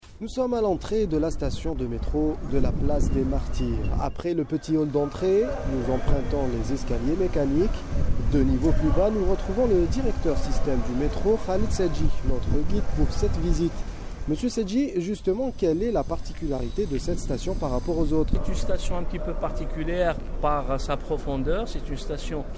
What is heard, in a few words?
metro